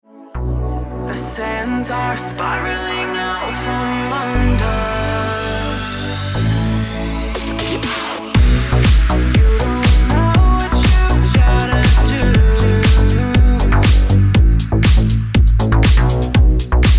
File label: Ringtone